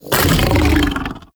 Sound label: Vocal Attack Short; Warframe; Predasite; Hunting Critters; Characters